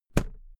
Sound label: briefcase close slam; industrie wirtschaft; bueroarbeit